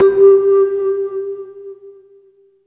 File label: Chime; Electronic Point Blank